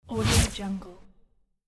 neon whoosh

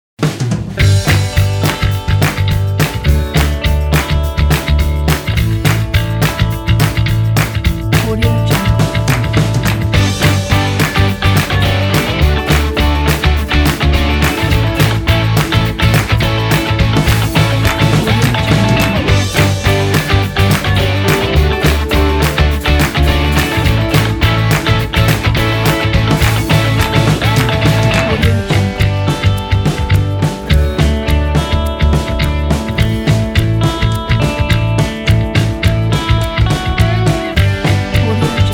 indie energetic uplifting rock

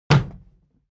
object box plastic big kick hard

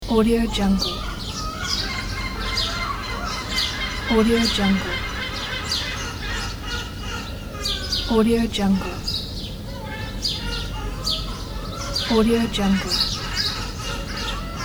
morning hours with seagull birds and crows

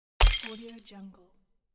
glass break

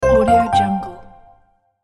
notification element response

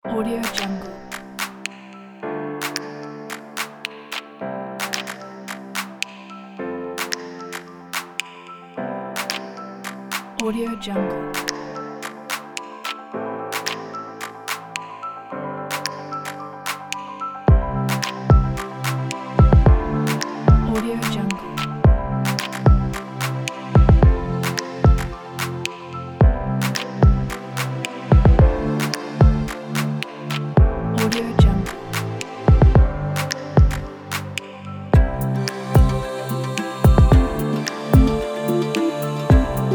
the corporate ambient pack

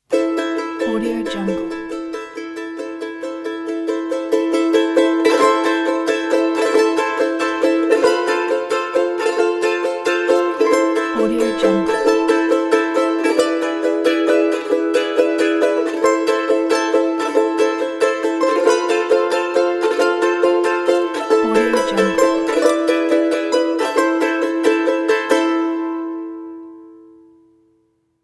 minimal acoustic intro; ident

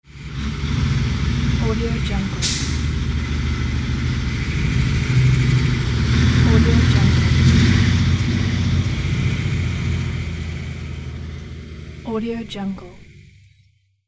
heavy truck passes by

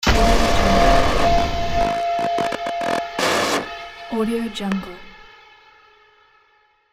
scifi portal

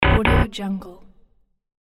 wrong answer buzzer

